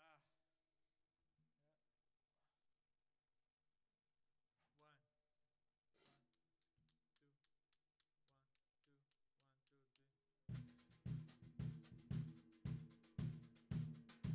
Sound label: Tom